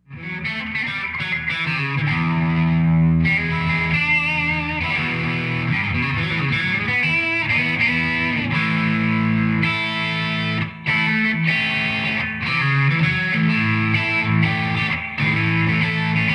Telecaster Swing blues Neck and Bridge PU Moderate Grind